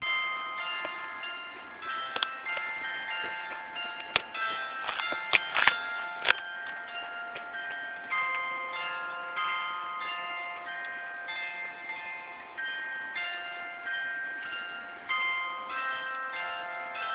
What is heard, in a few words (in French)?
Clocher; bouillon Belg